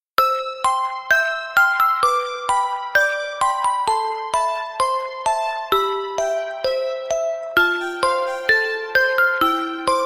Canon, ringtones

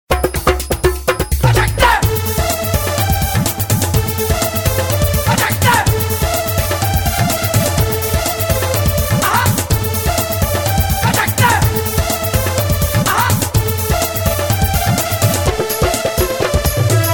Melody; LG; A290-Alt

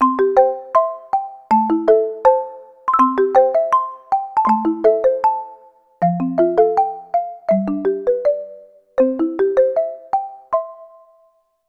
Flicker; INOI 2 Lite 2019; ringtones

